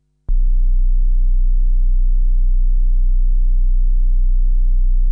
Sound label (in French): infragrave nappes statiques, Sample lourd et appuyé